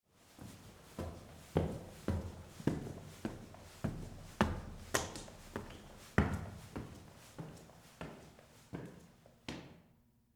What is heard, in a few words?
Stairwell Walk Up, Footsteps, Stairwell, Walk, Up, Reverb; Human; BlastWave